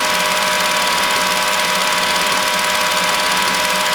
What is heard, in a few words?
graphite spin, weapons; tf2classic